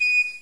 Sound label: pipe